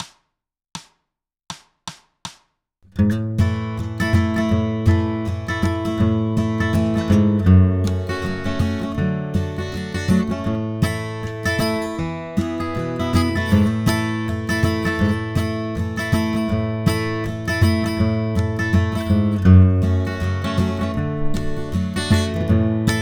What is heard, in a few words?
rhythm, Bluegrass Guitar, G; getting away from alternate bass playing